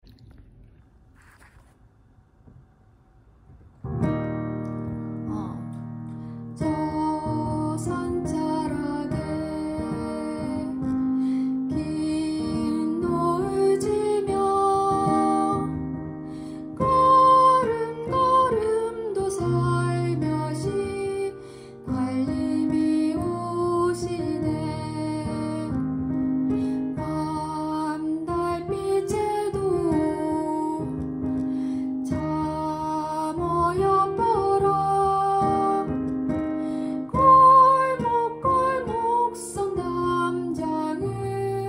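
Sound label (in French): soprano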